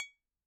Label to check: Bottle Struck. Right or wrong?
Right